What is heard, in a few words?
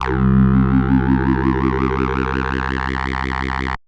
Fat Rise, techno drum fx; Single Hits